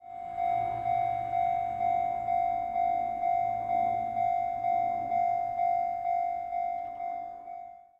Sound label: fumikiri